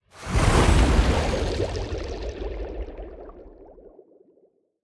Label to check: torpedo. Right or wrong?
Right